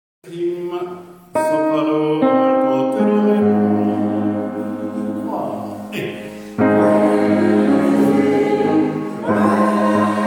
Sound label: praise him tous